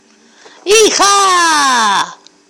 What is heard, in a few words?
ihaaaa